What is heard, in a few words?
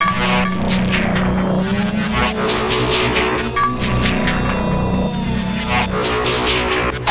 Alien Battle; Lego Battles 2009 NDS